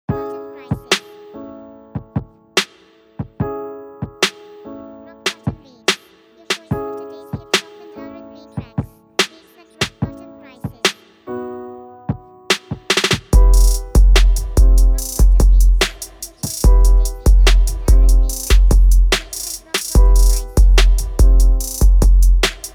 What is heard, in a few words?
BEAT SALES TAG